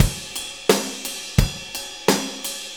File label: 4-4 Loop, Drum Loops, Full Kit Loops